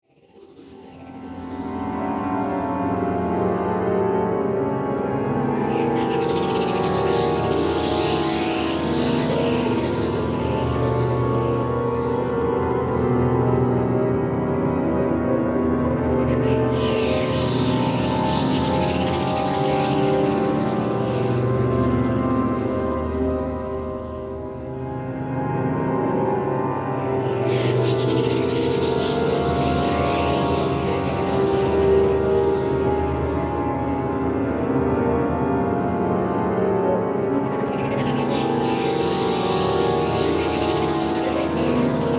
Bed Piano Reverse, Production, Element, Bed, Piano, Reverse, Swells, Eerie, Horror